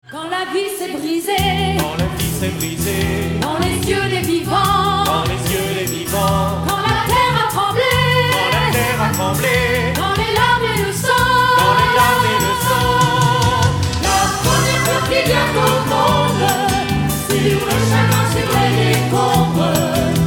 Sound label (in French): ext rire